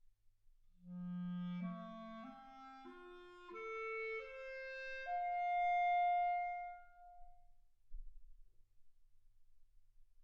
clarinet

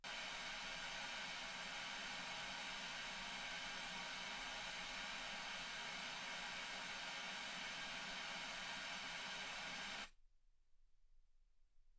wgn

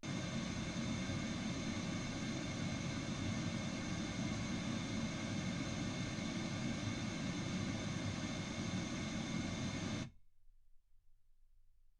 pink noise 48kHz

